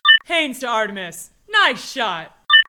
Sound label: shooting; Haynes; Artemis 2.7; HamakSector